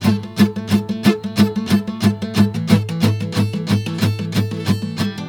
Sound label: GUITAR, Balkanish Traditions